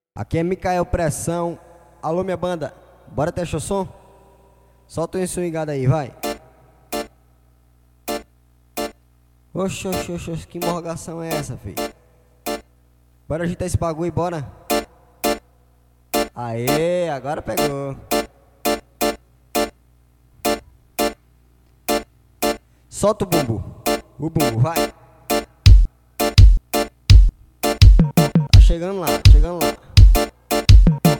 passagem de som

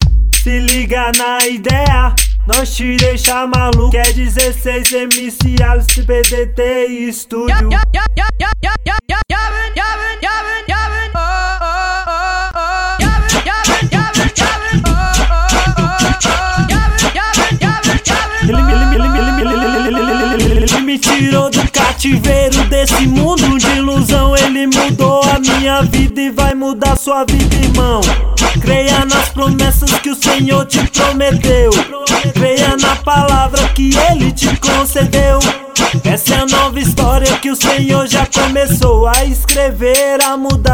gospel funk

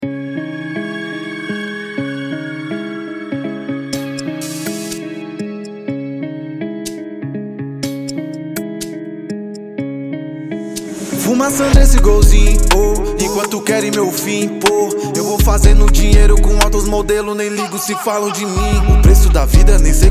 rap trap

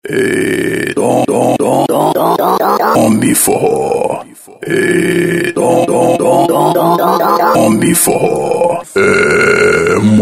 vinheta, Forró

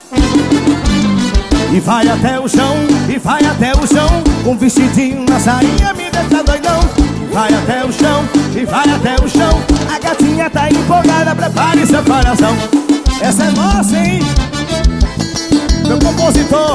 RÁDIO CORTÊS FM 87.9

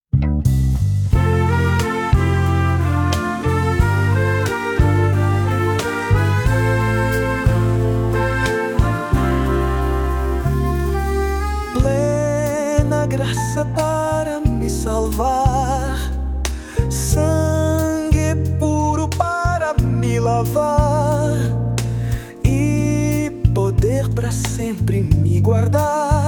hino da harpa crista; plena graca

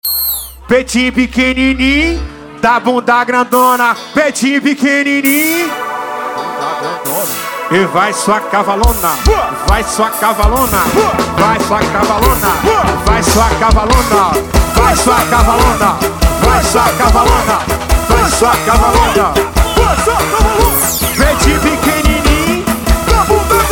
samba

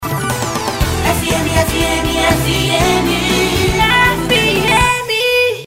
vinheta cantada fm; radio fm 2013

